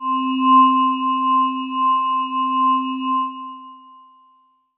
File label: Kawai PHm Glassy C4